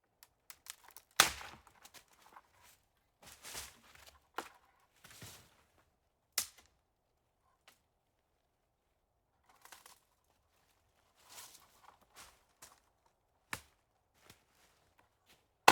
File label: hiking in forest